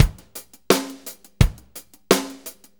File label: 4-4 Loop, Drum Loops, Full Kit Loops; Drum Tools